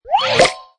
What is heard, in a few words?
deploy skeleton